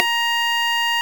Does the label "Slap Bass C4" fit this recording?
Yes